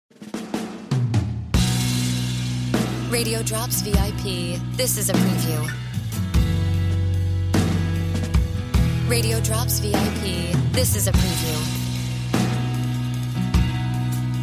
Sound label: Music Bed